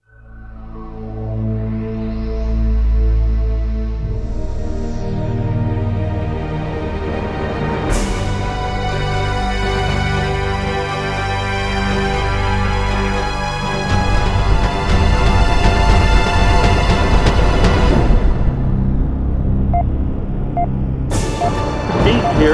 music credits; mcdemo

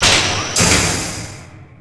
station door; terren; Earth and Beyond; EA Games

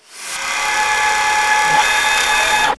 ship trunk open; Earth and Beyond; EA Games

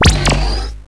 teleporter

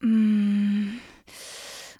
groan; Singles; French Version

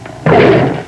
tiger roaring; jungle animals; Sound Effects for Multimedia - Volume 1

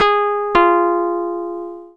DOORBELL

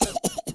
Cough; worms

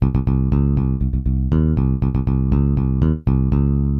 Bass; Metal Zone

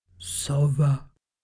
owl; TajemniceDomu

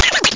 duck; amiga cricket

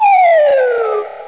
spider

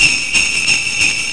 prop; PL SANTA

